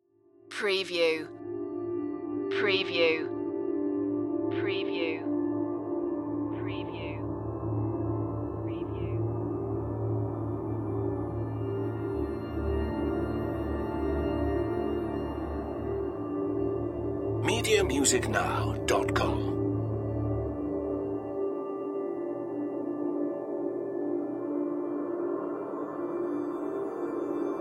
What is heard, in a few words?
dark ambience